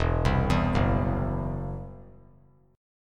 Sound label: E Suspended 2nd Sharp 5th, Esus2#5 chord; arpeggiated